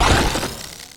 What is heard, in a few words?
death